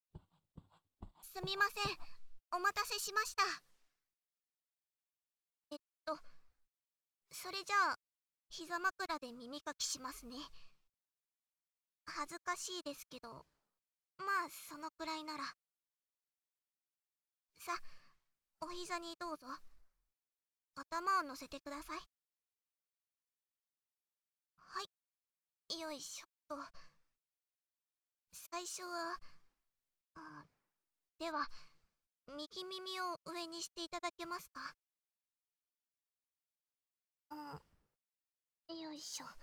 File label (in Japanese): 綿棒と炭酸耳かき; 効果音有り